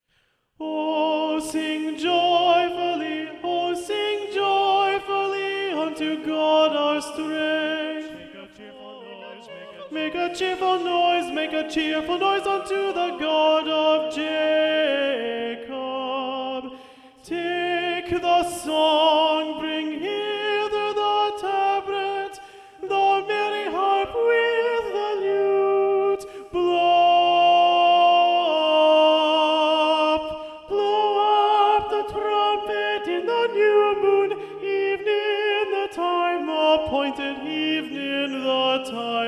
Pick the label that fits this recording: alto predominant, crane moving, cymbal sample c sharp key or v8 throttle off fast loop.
alto predominant